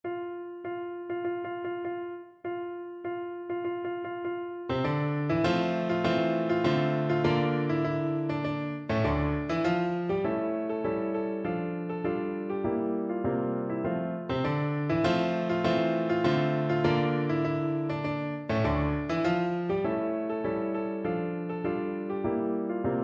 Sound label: algerian national voice